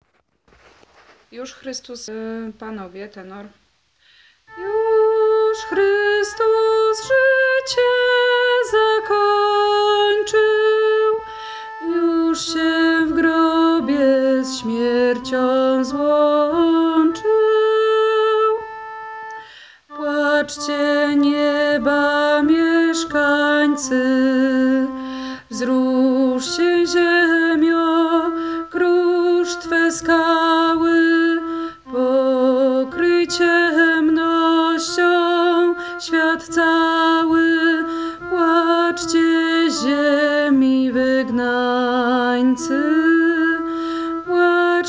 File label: Tenor; Juz Chrystus zycie zakonczyl; piesni wielki post